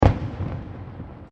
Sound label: Fireworks Multiple Explosions